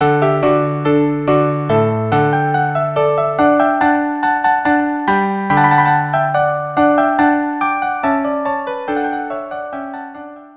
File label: rondeau trio; mouret